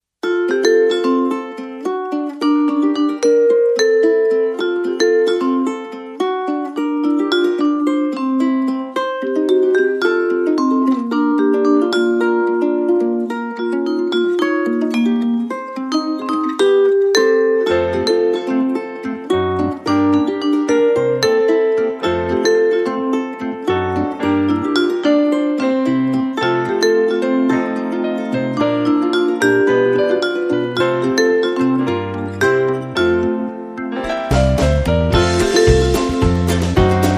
No Whistle

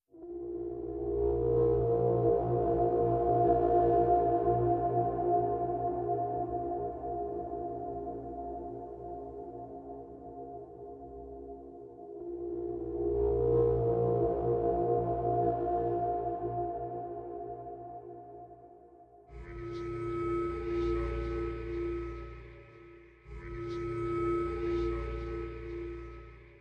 Synth Mix

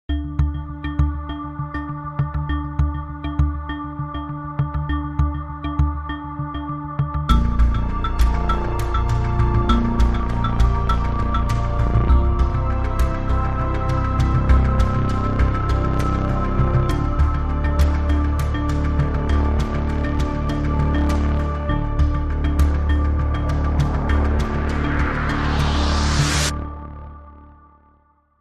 Emotions Run Deep, No Piano

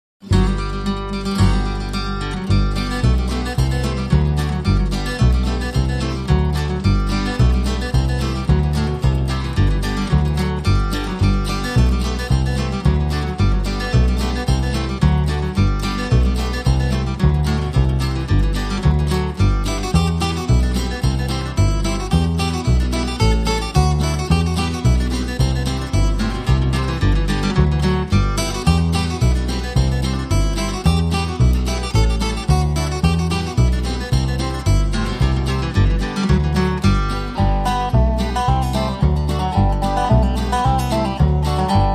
Flat Pick Fiddle Tune No Violin

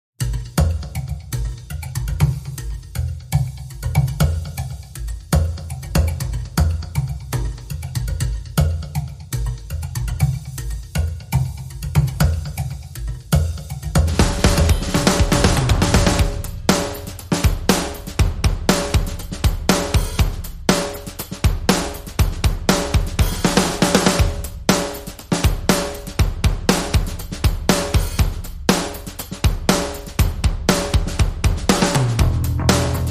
Drums and Bass

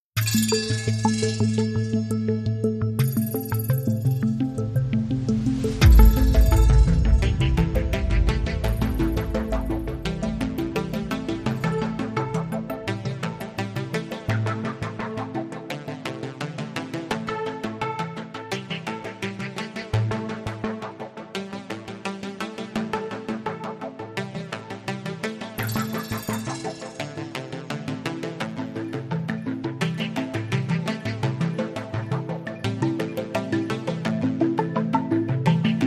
No Beat

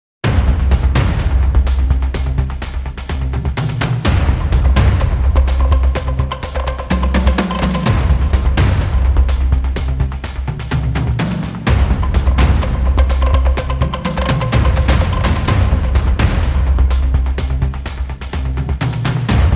Anxiety Ticker, No Strings

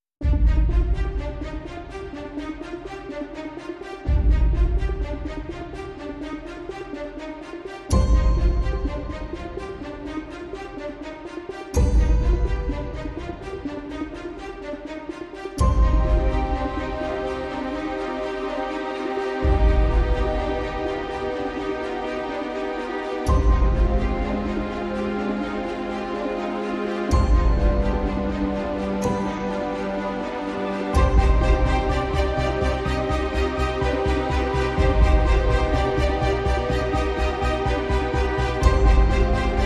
No Drums and SFX

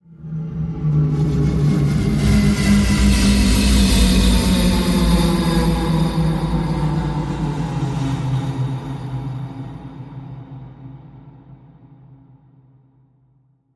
Journeys Time Space Transition

